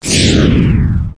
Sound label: Power Down Abrupt